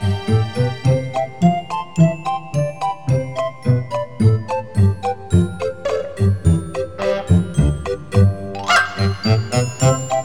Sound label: In Game Music; Animaniacs Ten Pin Alley; PS1